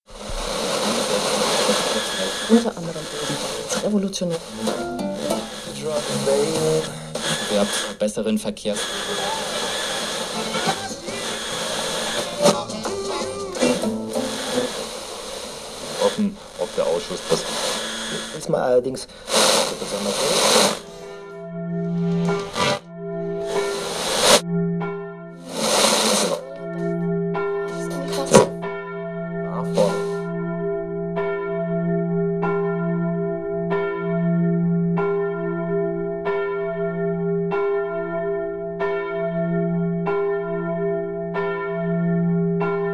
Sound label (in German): Radio Glocke; mixdown Tiefen